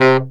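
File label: SAX STC C2, Baritone Sax Staccato; Orchestral; VarazdinOrchestral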